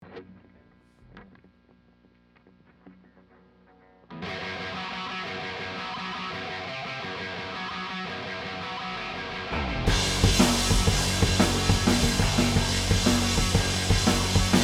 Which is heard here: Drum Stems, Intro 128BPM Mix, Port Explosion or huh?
Drum Stems